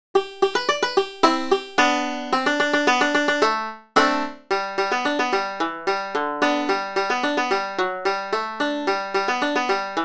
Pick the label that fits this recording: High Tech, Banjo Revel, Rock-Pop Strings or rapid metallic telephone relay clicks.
Banjo Revel